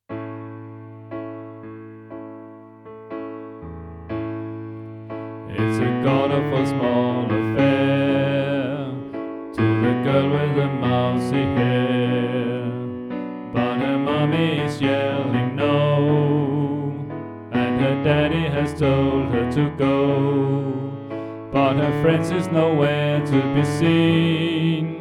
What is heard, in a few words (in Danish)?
Tenor; oevefiler